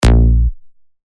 A#, bass shots; Moses; revealed bass shots vol 4

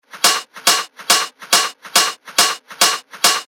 140 BPM, techno loops; Shadowkhan; revealed techno top loops vol 8

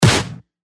Snare; Snarely; revealed claps snares vol 2

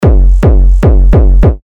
Kick F#; Paralyze; Revealed Terra Kicks

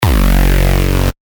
F#, bass shots; Driftstate; revealed bass shots vol 3

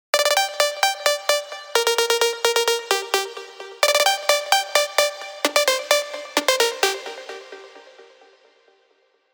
Plastic Inside, plucks; revealed spire ultimate plucks vol 1

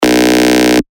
B, bass shots; One; revealed bass shots vol 3

